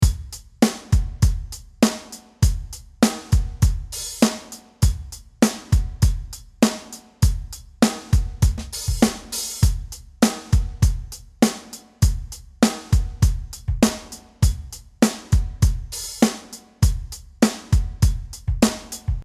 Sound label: Complex Drums